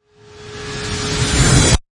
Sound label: Glassy Wipe Wipe